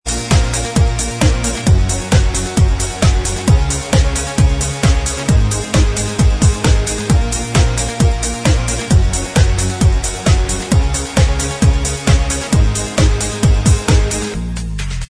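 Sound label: instrumental, backing tracks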